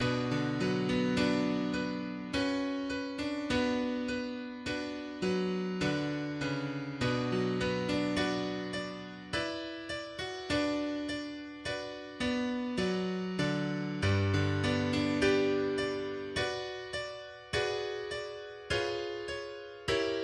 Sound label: Prelude Op 28 No 6 Tolling Bells